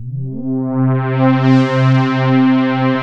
SY99; ALASKA; Rubber Chicken Software Sample Bank

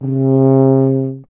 BRS TUBA, Solo Instruments

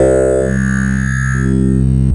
SYNVOICEPAD; SONIC ELEMNT; SONIC EL YES

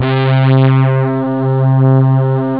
monan string; Amiga Soundtracker Sample Packs

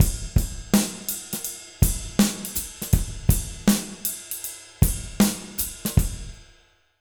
080 J ROCK, Jazz Latin Drumloops; USB Soundscan vol.08; AKAI

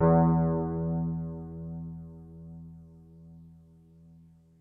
WURLI E-PIANO; Kid Nepro - Elite

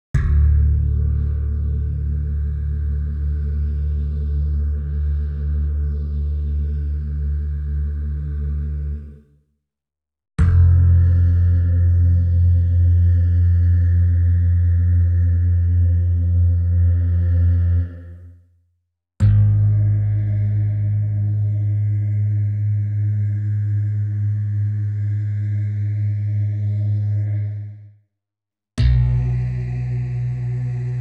Multi Bass Voice D+3; Klaus Schulze Collection

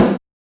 Kit Snare, Realistic Concertmate-670; One Shot Kit

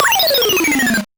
Noise A, 8-bit, Bitty Noises

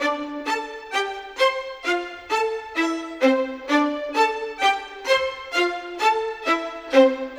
Violins; Sonic Foundry (Sony Creative Software); Orchestral Series VOL-4; Rock and Pop; Rock-Pop 15

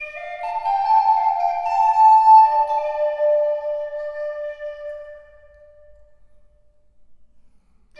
Moor Flute; Sonic Foundry (Sony Creative Software); Ma Ja Le Ethereal Textures; Flutes

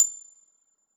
A6, Harpsichord; Harpsichord +ped; Total Piano

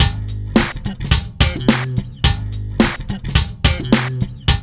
LOOP, Funky Ass Loops; East West

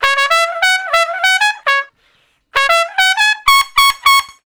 Trumpet Straight (Db), Horncraft for R&B Solos; Crimson Blue and Fabulous Horncraft 4 RnB

